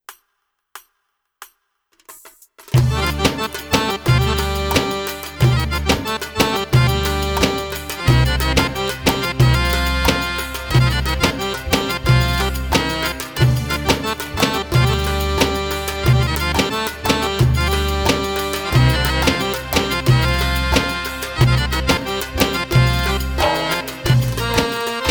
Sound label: Sombati Music, bellydance music